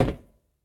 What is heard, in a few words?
metal step; Golden Crayon Sounds